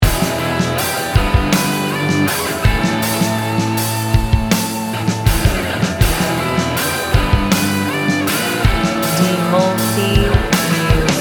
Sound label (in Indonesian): gym rock, Rock dengan sentuhan etnik, membawa nuansa tribal